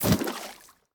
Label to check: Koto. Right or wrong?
Wrong